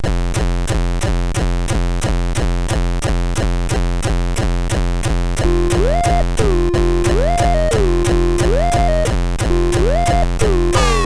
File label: Hardcore, MOS6581R4